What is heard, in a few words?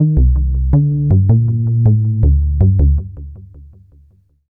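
synth bass, ambient, 160, C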